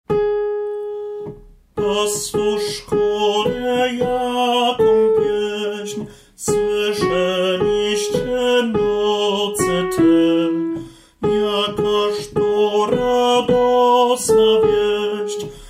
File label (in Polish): SOPRAN; Nad Betlejem